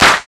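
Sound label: PER PROCU, Procussion, percussion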